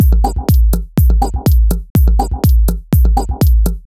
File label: 123bpm, House Drumloops; Zero-G House Fabrik Drumloops